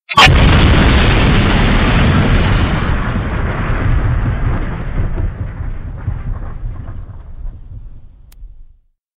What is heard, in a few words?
plane crash sound effect